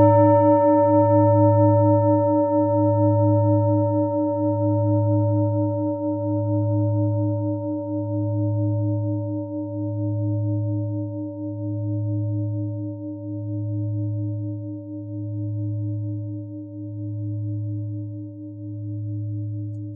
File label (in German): bengalen planetentonschale